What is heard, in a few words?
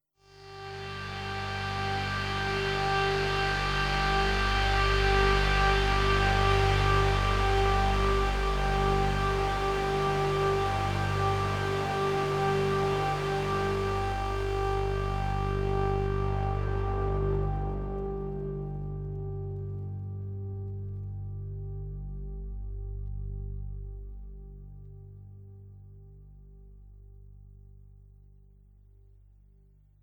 G drone